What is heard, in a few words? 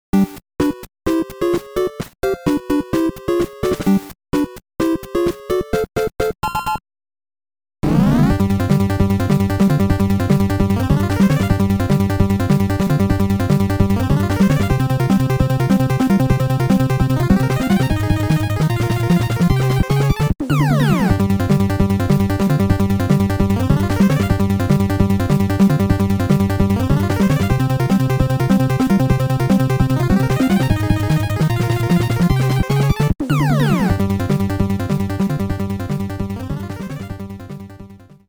Roller Coaster Ride; Stage 2; Castlevania Anniversary Collection